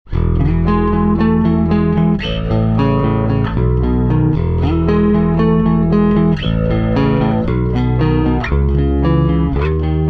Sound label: Dean Markley Blue Steel NPS Bass Strings 45 105